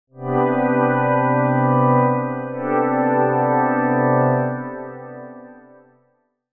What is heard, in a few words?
FM Pad